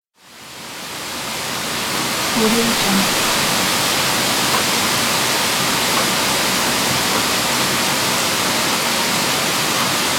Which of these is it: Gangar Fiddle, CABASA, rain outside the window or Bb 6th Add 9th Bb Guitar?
rain outside the window